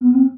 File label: ui dismiss notification; Stellaris